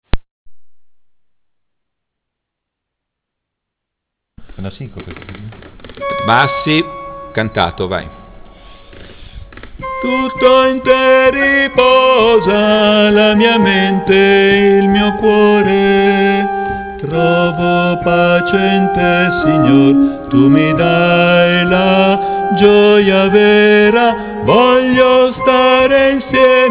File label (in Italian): Bassi Strofa Cantata